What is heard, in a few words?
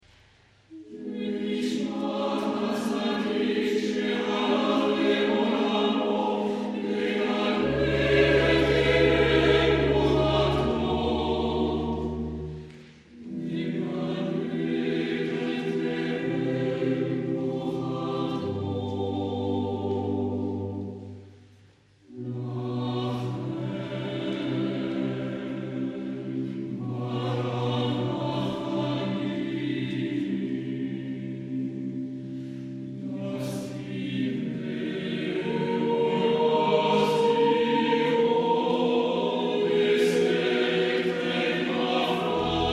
el male rahamim